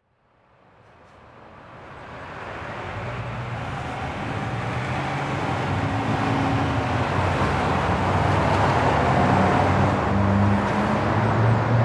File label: Continuous Traffic